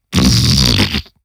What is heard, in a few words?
fart shart